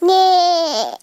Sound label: sheep